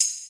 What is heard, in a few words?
tambourine; ETHNIC and WORLD PERCUSSION